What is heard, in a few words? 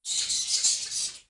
bats overlay